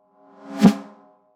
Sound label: Snare Fly By